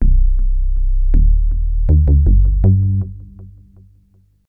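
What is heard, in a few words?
synth bass, ambient, 160, C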